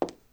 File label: stone step; Golden Crayon Sounds